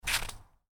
Footstep snow shoe, Shoes: Individual Footstep On Snow, Light; Human